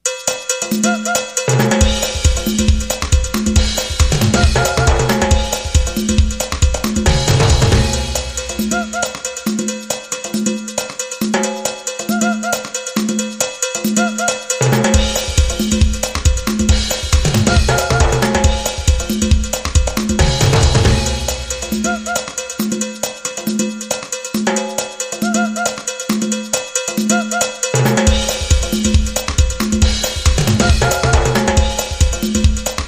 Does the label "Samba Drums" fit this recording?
Yes